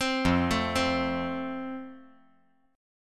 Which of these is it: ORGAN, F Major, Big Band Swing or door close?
F Major